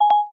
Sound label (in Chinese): SIRI音效